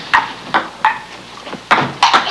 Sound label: PINGPNG, HAUSHALT